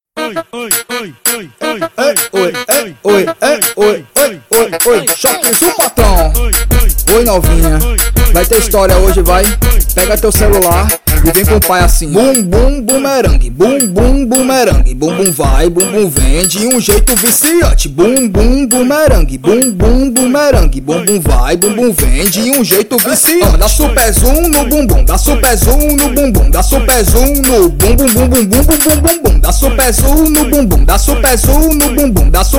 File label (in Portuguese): brega funk; autoral